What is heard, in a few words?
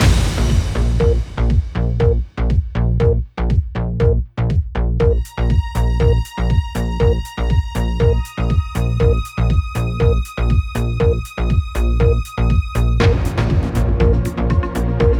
BBC World News Countdown